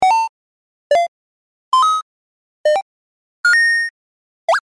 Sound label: 8-bit coin sound effects